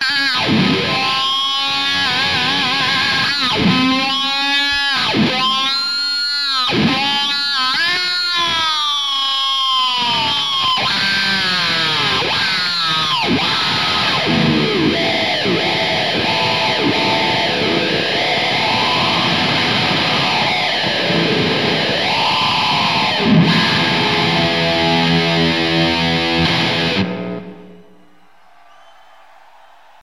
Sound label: Guitar Solo